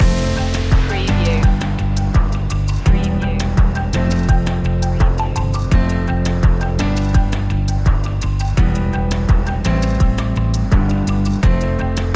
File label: breaking out loop